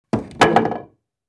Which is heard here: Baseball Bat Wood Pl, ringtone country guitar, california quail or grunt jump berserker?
Baseball Bat Wood Pl